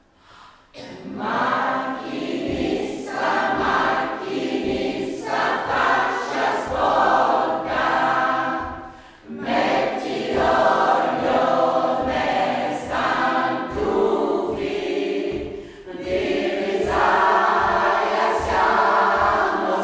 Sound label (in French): extrait saluteremos chant des mondines